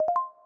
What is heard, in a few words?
Select